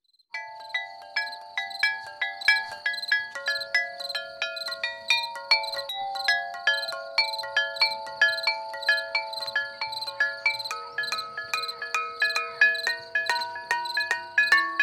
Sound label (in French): Lithophone; Florent Ville au festival jeune public a Saint Michel de Chabrillanoux; Ambiances Sonores d Ardeche